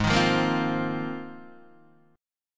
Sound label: G sharp 6th Add 9th G sharp Guitar DADGAD